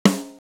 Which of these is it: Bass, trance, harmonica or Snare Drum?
Snare Drum